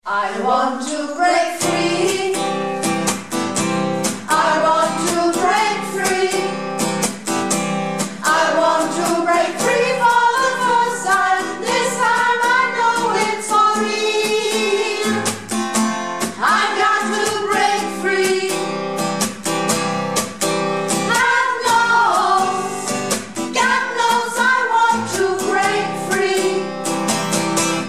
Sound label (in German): Stimme 3